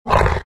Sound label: grunt, greevil; npc; courier